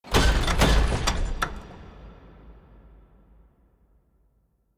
bang

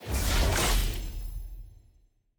loot reveal shard rarity none

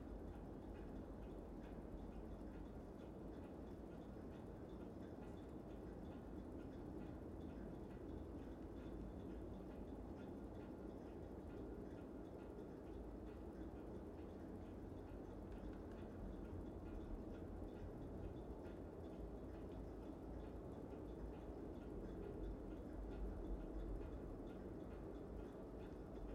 amb collection post explo; jinx

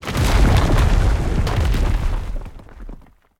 action rockslide full

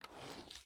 ui pawn move

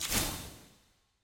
silver burst

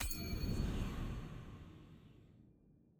ui start button click; demacia

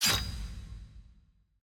ui tutorial popup

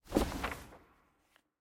sfx trans record collection return click; jayce

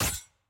skill hit; gwen; skill 1

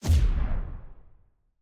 back click

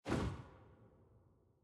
whoosh back

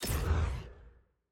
room select back

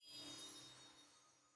button start now hover; new player experience

